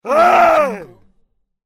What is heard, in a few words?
loud male pain scream